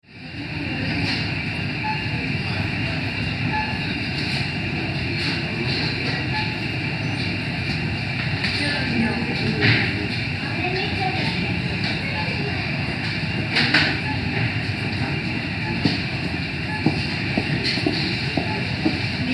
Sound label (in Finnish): Kauppakeskus